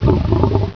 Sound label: GRUNT